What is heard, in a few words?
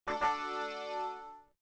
complete; sieGet